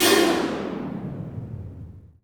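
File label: POWER SLAM; Northstar; The Wizard VOL-1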